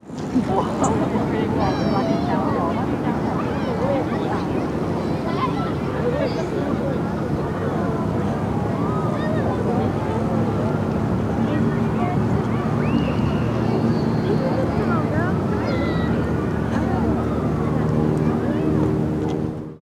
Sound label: Hermann Park Ambient; HOUSTON